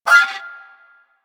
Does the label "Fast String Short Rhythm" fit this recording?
No